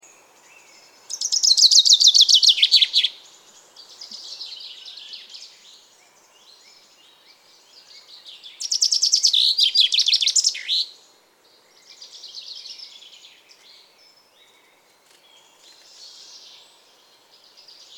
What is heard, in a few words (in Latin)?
Fringilla coelebs; SETE